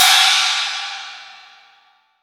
crash one shot d key, crash cymbal single hit tuned to the D note